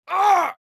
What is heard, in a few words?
Male short screaming